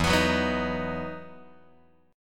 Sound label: E Major 7th Suspended 2nd, Guitar